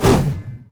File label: Skill Spirit Hook Right